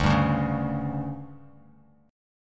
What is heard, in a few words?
D Major 7th Suspended 4th, D, down, Bass Standard; DM7sus4; strummed; JGuitar